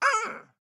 town human road hit; Blind Drive

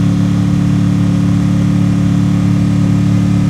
Mustang Loop; Automobiles

